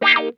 WAH, Funk Guitar; Zero G - Funk Guitar

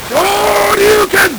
LSDj, Nintendo Gameboy; 300 Drum Machines

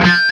HARMONC DRAG F 3, guitar; East West; Steve Stevens Guitar Samples Collection

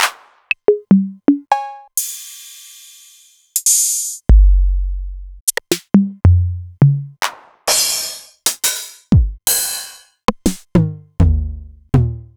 Novation Drumstation; DrumstationV1

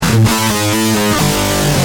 G Heavy, Guitar; Industrial

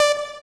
SYN POLY, JD 800; Sound & Vision - Gigapack I CD 2; JD 800/990

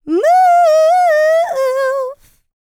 LICKS, Vocal R&B Dance; LT DANCE

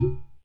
UDU SLAP, African Perc; E-MU Formula 4000 Series Vol. 4 – Earth Tones

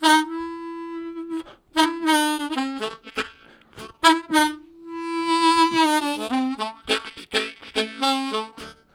Long In, Harmonica Riffs; Zero G

